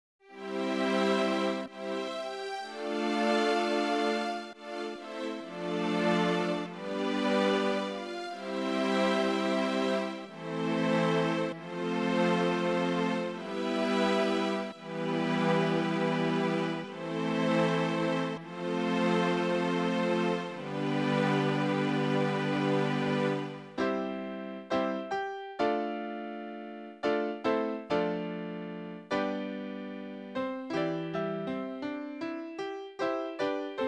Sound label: piano